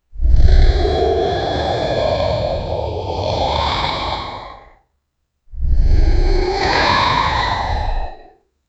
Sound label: aggressive dragon sound; mythical